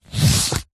board game game card remove slide out from pile stack; telstrations